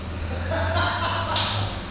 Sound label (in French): rire